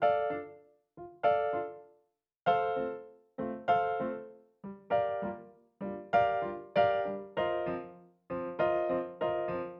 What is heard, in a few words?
Acoustic Piano